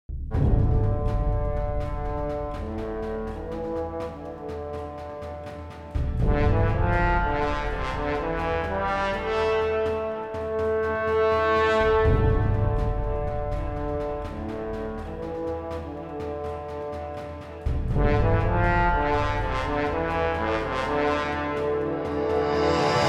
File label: Distant Drums; Filmic; Action; Adventure; Military Front; RSM library album, War & Peace